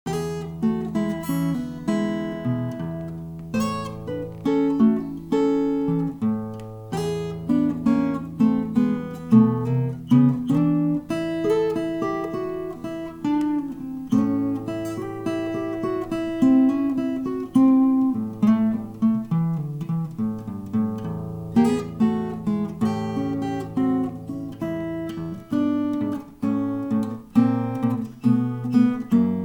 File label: blues; ozark